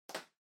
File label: Packaging Air Cushion Far Pop; 梱包用エアークッション; 遠い 弾ける; 梱包材